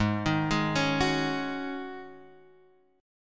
G sharp 7th Suspended 4th; arpeggiated; 4,6,6,6,7,x; G#7sus4

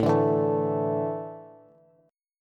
A Suspended 4th, Bass; Asus4 strummed; JGuitar

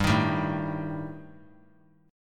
G Suspended 2nd Sharp 5th; Gsus2#5 strummed; JGuitar

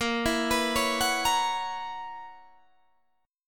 Gb 11th Bb

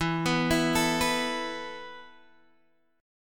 E Suspended 4th E, down, Guitar, Half Step Down

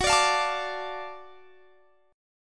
Gb Minor Major 7th Sharp 5th Gb down; strummed

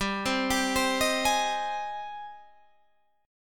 Ab Major 7th, chord

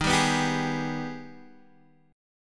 Ab Minor Major 7th

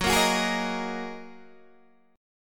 Gb 6th Add 9th, Guitar, DADGAD; chordshape; down

